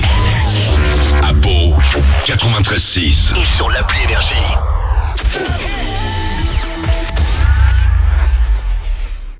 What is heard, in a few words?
JINGLE; PAU, 93.6 FM; NRJ